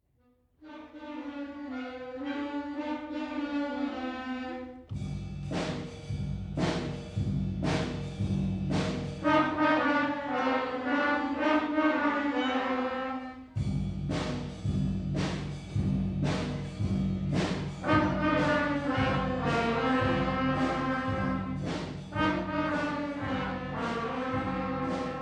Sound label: blues